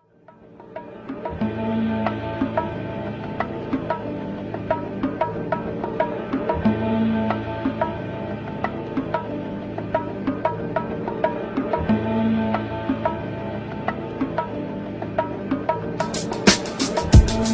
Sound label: acid raindrops iphone 7 plus ringtone